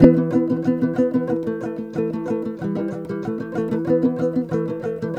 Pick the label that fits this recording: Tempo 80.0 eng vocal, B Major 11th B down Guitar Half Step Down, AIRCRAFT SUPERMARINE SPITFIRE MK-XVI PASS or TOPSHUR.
TOPSHUR